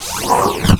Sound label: Clan Dojo Door Close; Doors